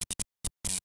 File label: Electric Particles; Caffiend